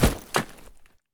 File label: body drop asphalt; Escape from Tarkov